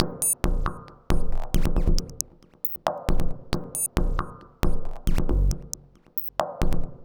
Mod Loop, Modular Percussion

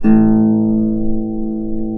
A0 HARP; USB Soundscan vol.62; AKAI

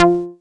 chord mono F, Chord Shots, Chicago House; Classic Chicago House

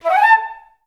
FL PKUP A4, Advanced Orchestra; FL PICKUP; Best Service; Peter Siedlaczek's Advanced Orchestra

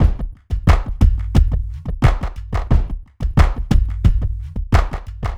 tribalize, Loops; Distored Reality

